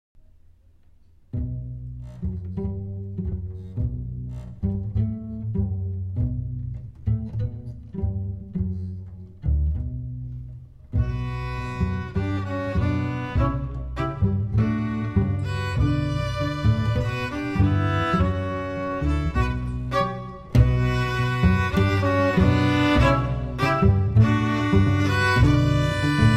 Tango orch

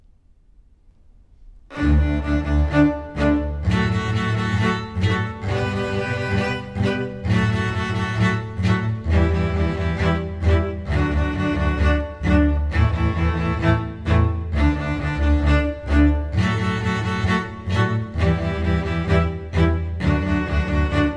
Twinkle orch; Twinkle Icky

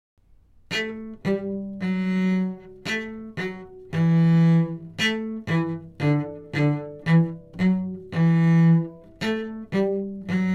Bow Cello

